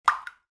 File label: water droplet